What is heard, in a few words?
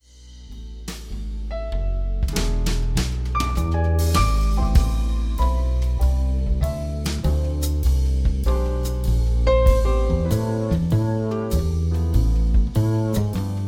jazz trio